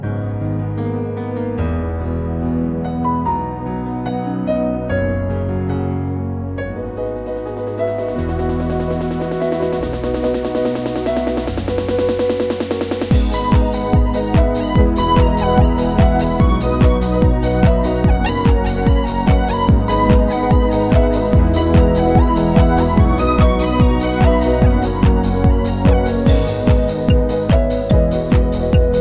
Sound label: Lyric Trance